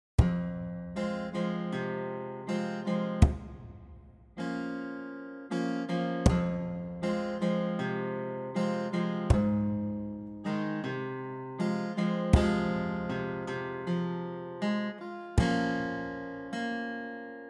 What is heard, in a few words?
Modern Folk Reflective; Auto Compose; amperAI